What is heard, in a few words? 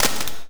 Perc Hit, drums; Drums & Drones